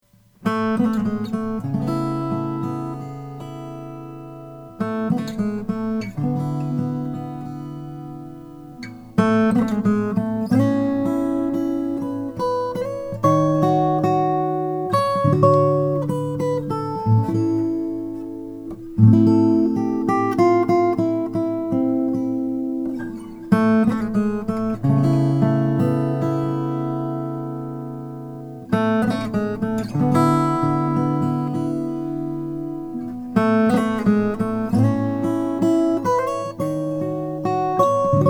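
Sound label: mustapick om fan fret cutaway brazilian rosewood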